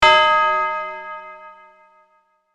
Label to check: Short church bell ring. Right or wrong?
Right